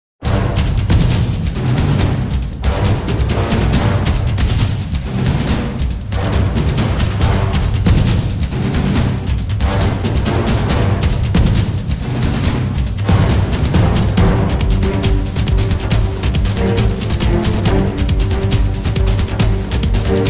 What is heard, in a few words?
combat mix